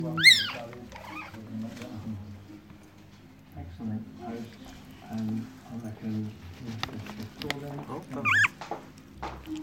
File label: Happy Squek food etc